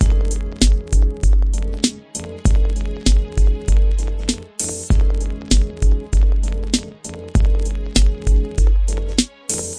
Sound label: straight ahead crackle hop, drum loops and breaks, 98 bpm; 091 to 100 bpm